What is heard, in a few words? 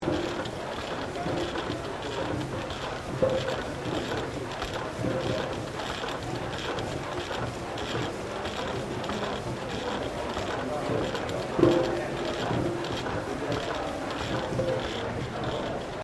Steam Engine Tandem Verticle 1877 Operating Loop, Colonial era machinery; PHOENIX